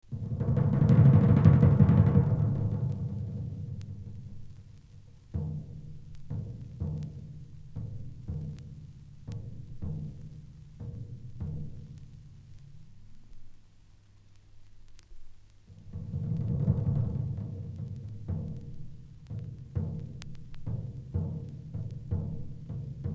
African Suspense; KPM 1000 series

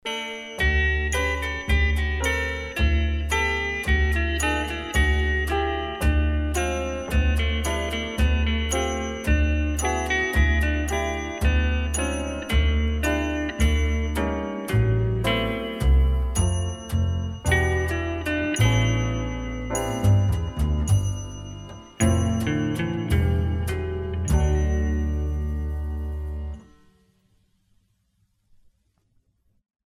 Petite Prelude (Guitar); KPM 1000 series

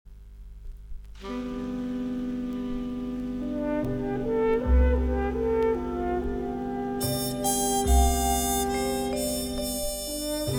Lonely Horn Solo; KPM 1000 series; Underscore

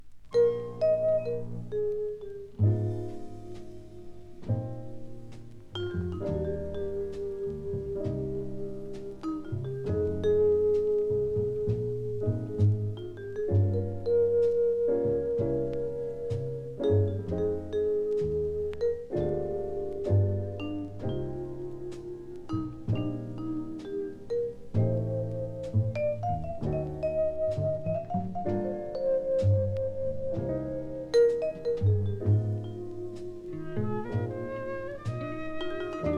Modern Jazz Septet; KPM 1000 series

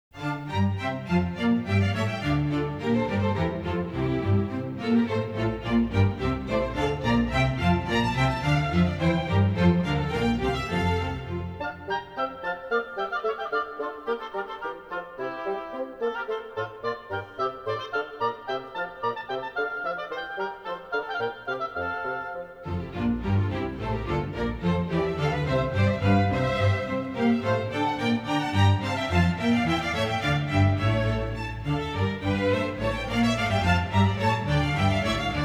Bourrée; Fireworks; Network Production Music

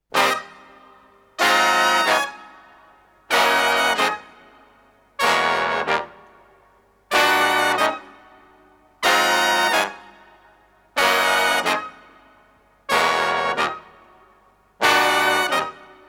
Cut To Size (Brass); KPM 1000 series; Flamboyant Themes Vol.2

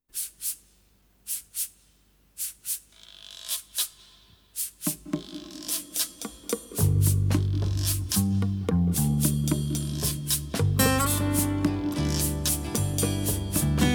Guitar Serenade; KPM 1000 series; Gentle Sounds

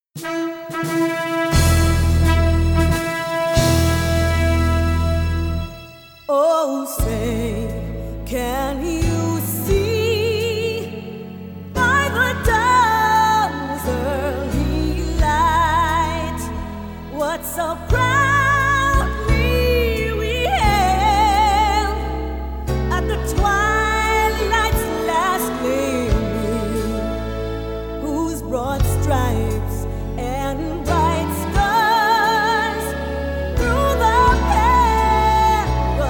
Star Spangled Banner Vocal; Network Production Music; NM099 Patriotic America (Specialty)

